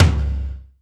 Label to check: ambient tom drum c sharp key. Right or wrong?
Right